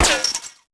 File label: wireframe hit, bullet hit; projectiles; Max Payne